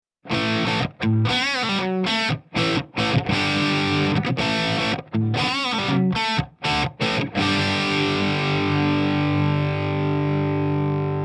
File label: SH4 Bridge Dirty; guitare; SH4 position chevalet; saturé